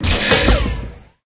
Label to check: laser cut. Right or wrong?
Right